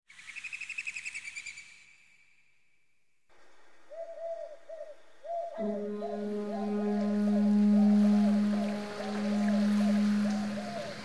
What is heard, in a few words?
Quaint Fishing Harbor